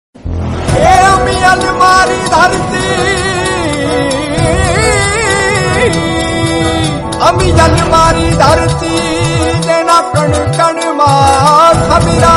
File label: gujarat ringtone